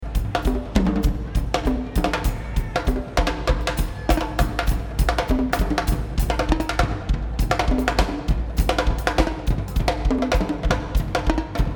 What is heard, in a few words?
Drums